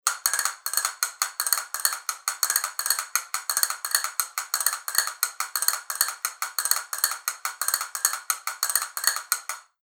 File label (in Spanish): fibra veteada doble caja, castañuelas; Del Sur